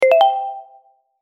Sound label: notify new